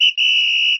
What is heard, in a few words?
whistle